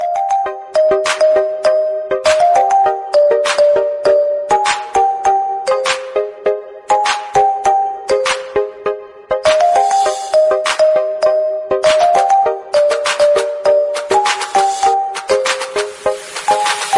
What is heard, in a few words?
chinese instrumental